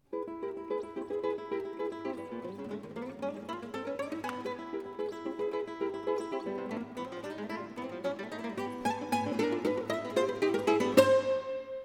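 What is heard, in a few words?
Ballet of the Little Chickens, guitar; Yamashita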